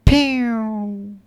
hand gun

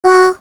player hurt, vocalization; carbon miku; captainbigbutt; kuroyubots

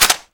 ak clipout; ak beast; csocf weapons pack

